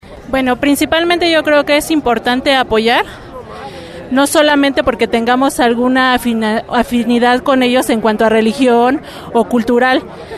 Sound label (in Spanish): marcha; palestina julio 2014